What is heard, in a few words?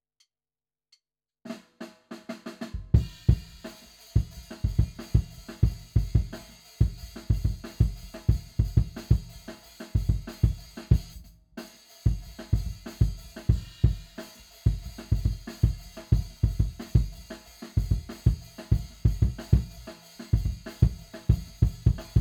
Kick IN